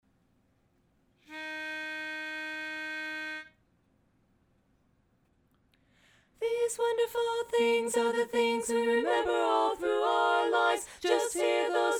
Four Part; holiday music

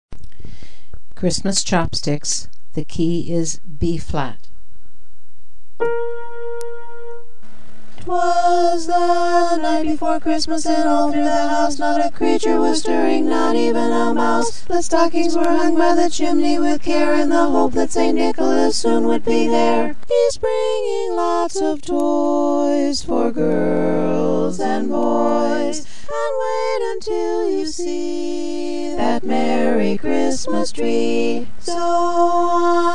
4 Part; Xmas Chopsticks; holiday music

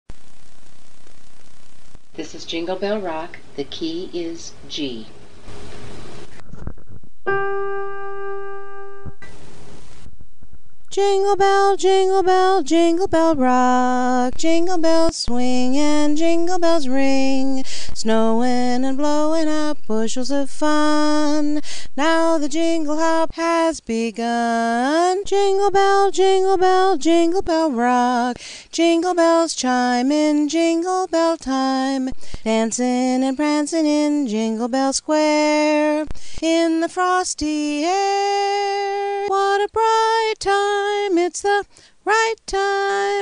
lead; holiday music

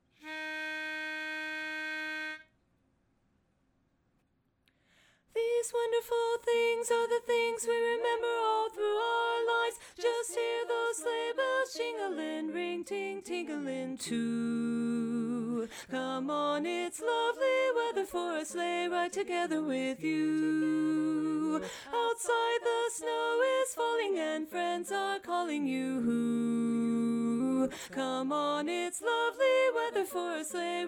Lead Dominant; holiday music

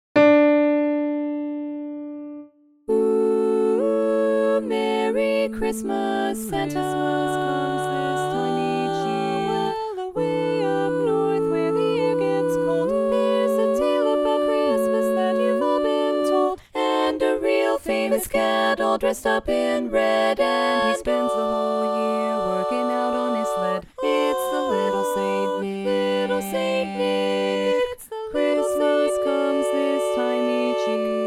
Tenor Dominant; holiday music

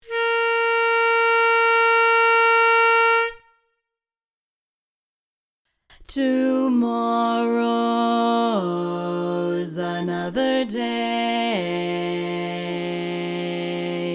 loud bass

